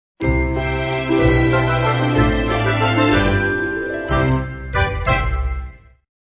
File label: Fairy Tale Ident Reduced mix